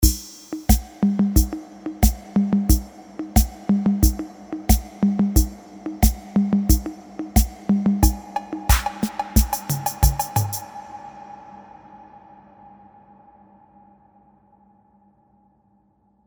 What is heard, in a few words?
Drum Machine